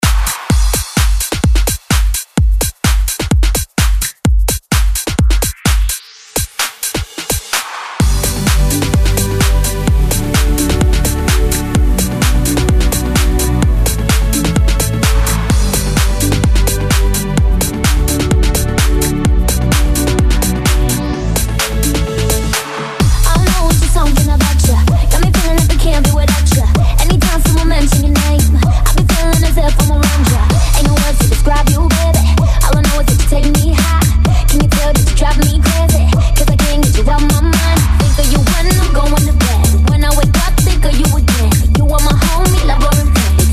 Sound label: breaker 128 bpm